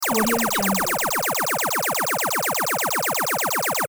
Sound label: retro scifi video game laser gun loop